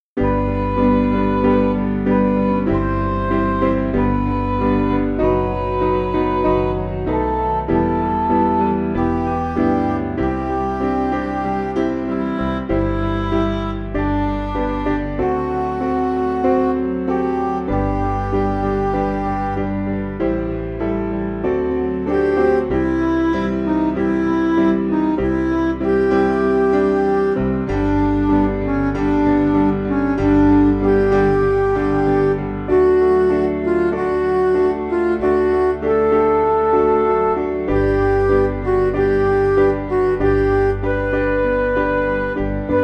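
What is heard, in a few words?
Music Backing Files for Churches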